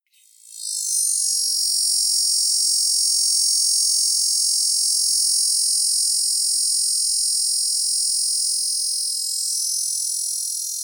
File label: Cacama valvata, cicadas songs; US; TX; cleaned